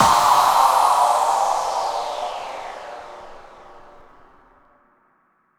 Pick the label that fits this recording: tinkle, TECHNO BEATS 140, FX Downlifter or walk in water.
FX Downlifter